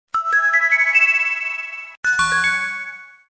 Light SMS, Ringtone

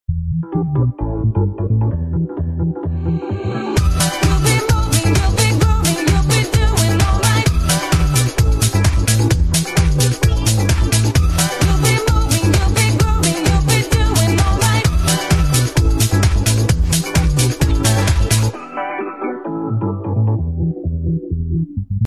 Nokia N95, Ringtone

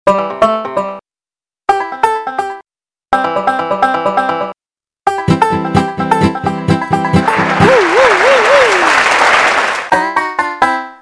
Lg Banjo, Ringtone

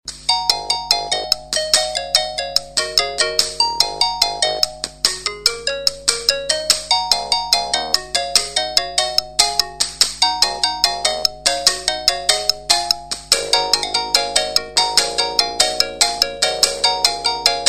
Vertu Ringtone

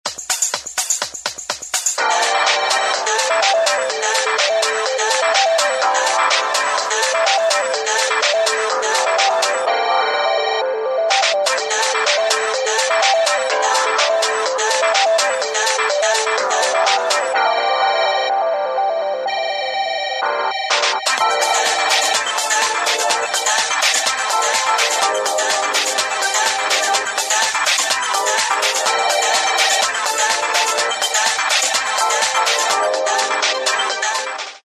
Calling Trip, ringtone

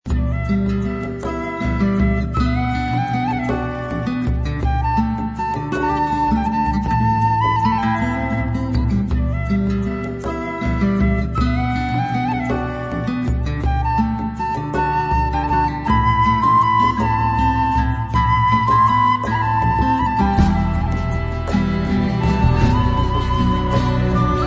Shepards Flute, Ringtone; LineAge 2

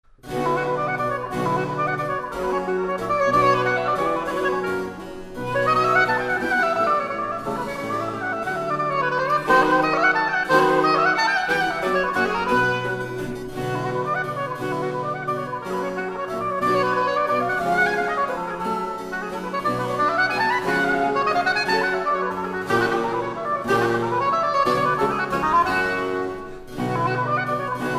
allegro sonata in c minor; vivaldi

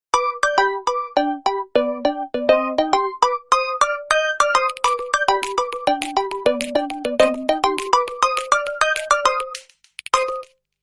Nokia, Ringtone

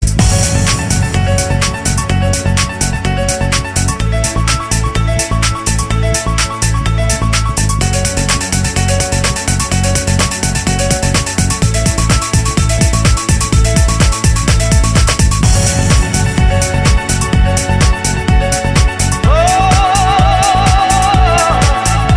Nokia Sandy, Ringtone

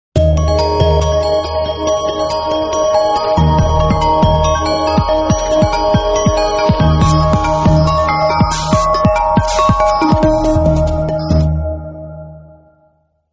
Nokia Asha High-speed rail, Ringtone

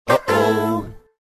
Incoming chat, SMS ringtone

